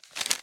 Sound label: multiplier Unfold; reef raider